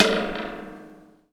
Thunder Drum

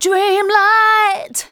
vocals sung; Techno Trance Essentials

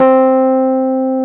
piano Rhodes; Sound & Vision; Gigapack I CD 2; PIA E-PIANOS

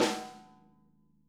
SNARE, Interactive Drum Kits

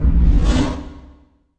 IMP FLAME, Cinematic Impact

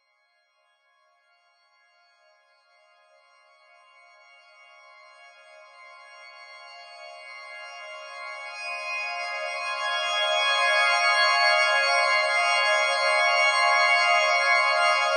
c maj pad

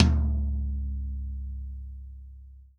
TOM, Real Drum Kit, POP KIT

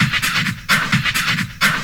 130 addon, DRUMLOOPS, HIHAT

